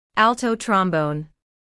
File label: alto trombone